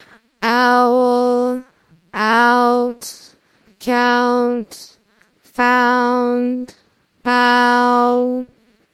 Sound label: singing